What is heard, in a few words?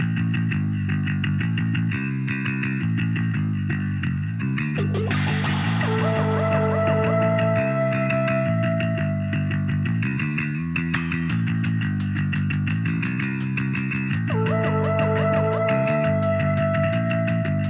Jam Session Shooting Range; Splattack!